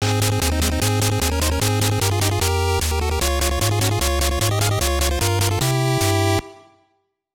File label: Match Start; Don Flamenco